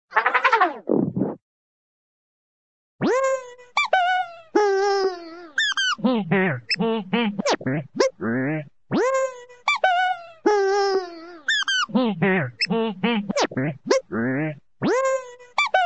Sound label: machine, cartoon martian machine